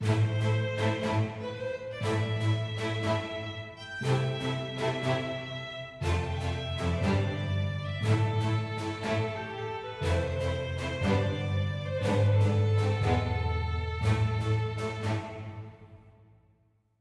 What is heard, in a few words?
heroic med